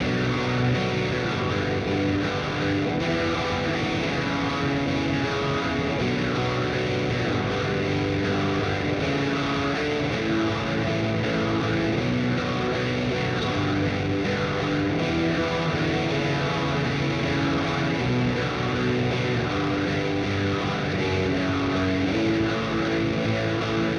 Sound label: Main Riff Guitars, shoegaze